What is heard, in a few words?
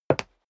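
step dress boots wood parquet single right, footsteps; schritte; menschen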